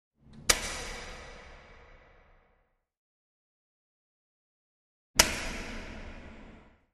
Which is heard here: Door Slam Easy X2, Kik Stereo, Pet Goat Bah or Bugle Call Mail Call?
Door Slam Easy X2